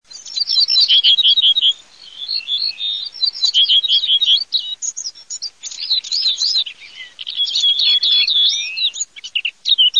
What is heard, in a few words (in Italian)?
capinera ortolano, birdsound